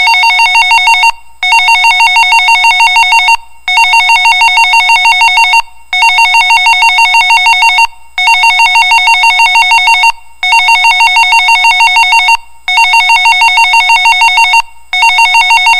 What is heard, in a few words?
special warning